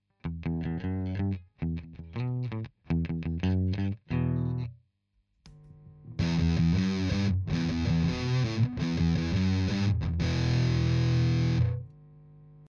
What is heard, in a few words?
Bridge Sustain